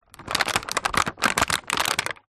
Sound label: foley plastic easter egg packet case movement; zapsplat